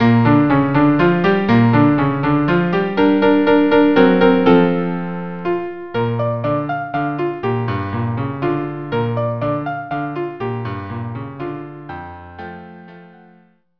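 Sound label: waltz violin cello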